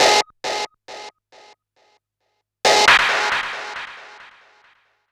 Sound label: CREEPY; Big Fish Audio; Uncivilised G'rooves